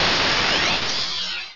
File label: Parabolic Charge; pokerogue; battle anims